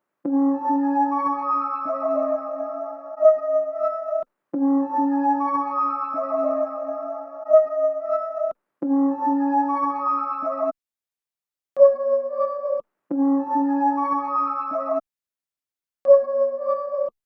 112 BPM C# Min Synth Lead; Melody; Trap; Loop Stems; Cymatics; 2022 Melody Collection - Trap Pt 2; Lucky Number

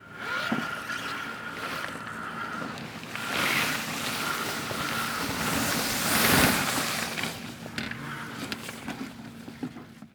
Boat Sail Hoist; Vehicles; Watercraft

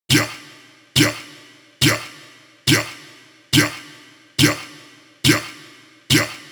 Vocal Chant Loop 140; Vocal Loops; Ghosthack Advent Calendar 2018 Day 9

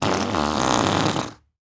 fart

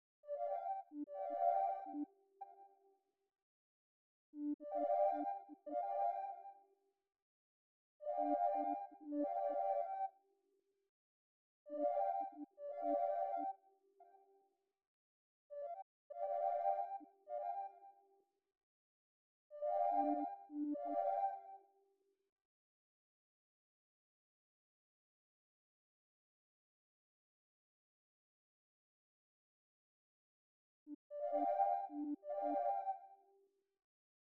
126 BPM G Min Texture; Melody Loops; Loop Stems; Hip Hop; Cymatics; Cielo

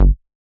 Squarehead Bass G

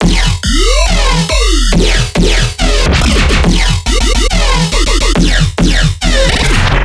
Riddim Bass Loop F# 140; Bass Loops; Claw; Ghosthack; Riddim Essentials Volume 2

